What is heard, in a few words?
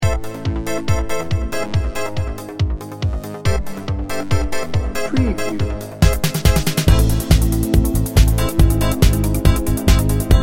fashion pulse